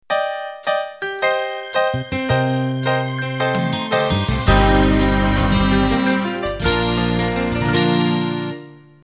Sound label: 70s Radio Pop Intro